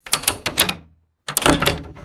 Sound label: heavy complex metal lock mechanism clicking; mechanical